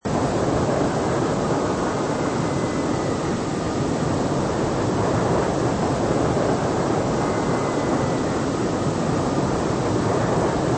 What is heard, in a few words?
jet